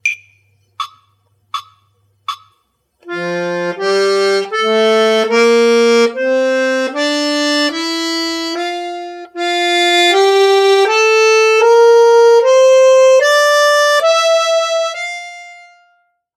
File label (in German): die f dur tonleiter